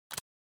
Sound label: Smartphone Lock Unlock, Dry